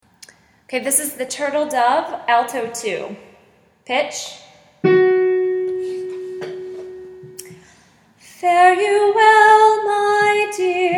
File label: alto 2; The Turtle Dove